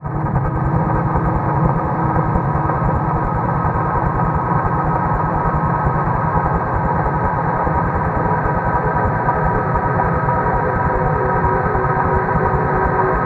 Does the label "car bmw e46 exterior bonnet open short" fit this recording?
No